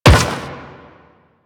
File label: Run Metal, Footsteps